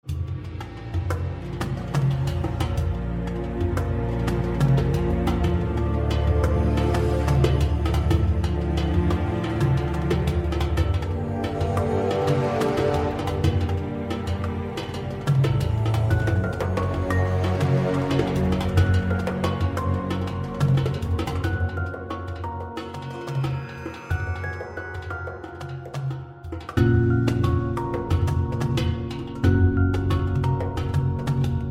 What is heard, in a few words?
synth